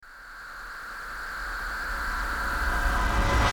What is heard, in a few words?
riser